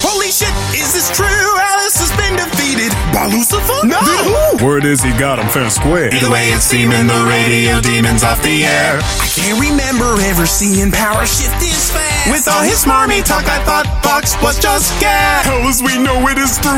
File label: Singing